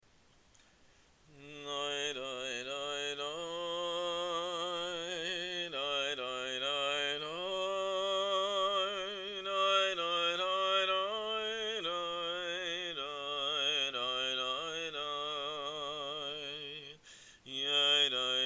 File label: nigun elecha; Cantor